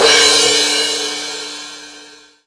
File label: Crash Real, Cymbal; One Shots; Drums; Ableton Live 12 Lite; Core Library